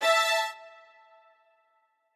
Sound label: strings